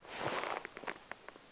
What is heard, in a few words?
Leather Creak Long; Civ4 warlords